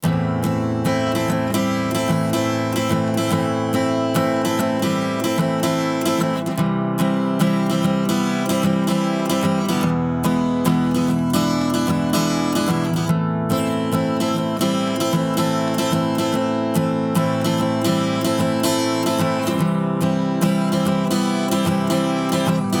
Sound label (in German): Gitarre; Tegeler Audio Vocal Leveler 500